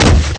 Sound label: land